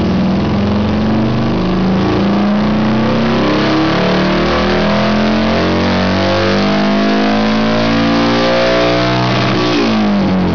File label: XB9 Dyno Run; buell